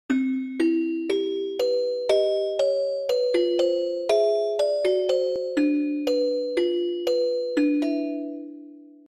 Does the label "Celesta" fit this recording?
Yes